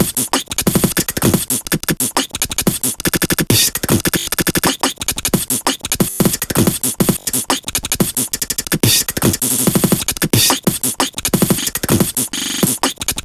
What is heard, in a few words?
beatbox break 180